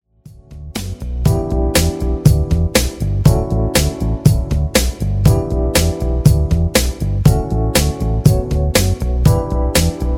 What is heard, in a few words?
funky flex; education; be active